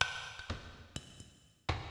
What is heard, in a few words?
bottle, ethno, 125